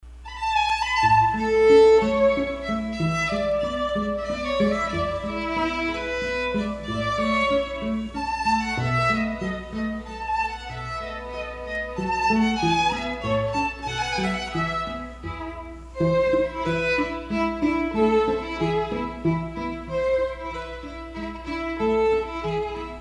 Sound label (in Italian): Minuetto